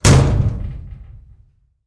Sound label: Vault Inner Door Close; safes & vaults